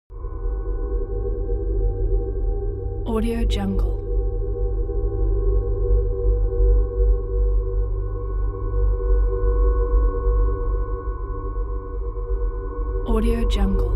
atmo drone deep tone metal breath; smartsoundfx

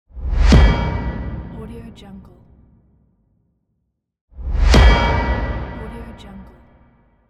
short transition kick

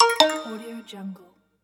user interface push dingdong

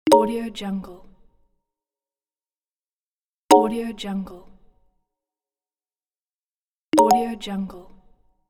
notification sound

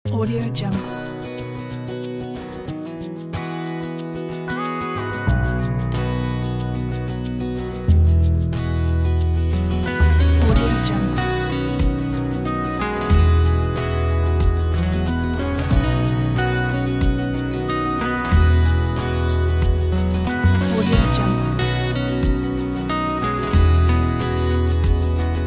inspiring acoustic indie folk

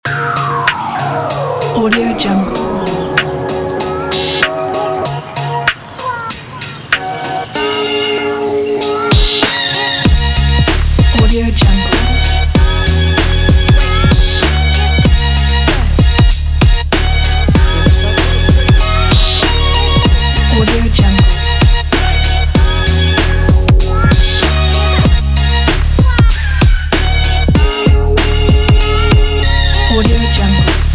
stylish hip hop

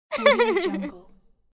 girl laughing

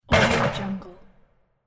basketball rim shot

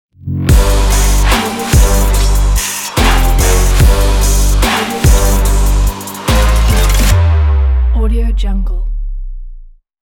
power trap logo

